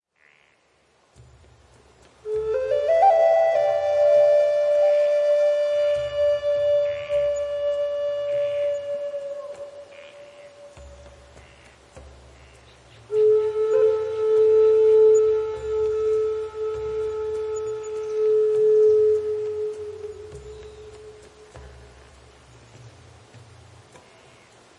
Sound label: Gentle Rain for Yoga Centering, Relaxing Native American Flute Music